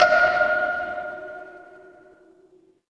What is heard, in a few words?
instrument wood block